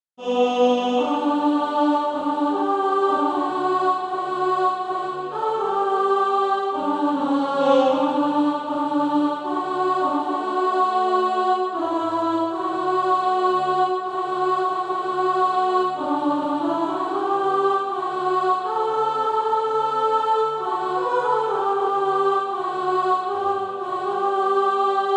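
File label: alto, SATB Practise Music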